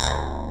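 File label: PULSE SAW BASS; Pro-Rec - Synthsonic; TRINITY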